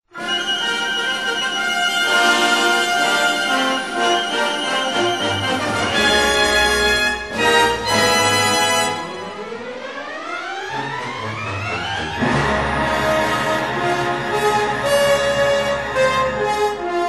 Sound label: vistavision fanfare; paramount